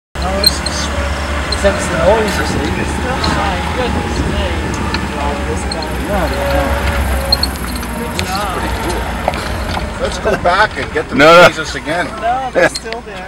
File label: game drive charge; lesotho